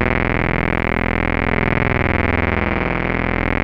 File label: 2600 F#1, ARP 2600; Hollywood Edge - Giorgio Moroder Rare Synthesizer Collection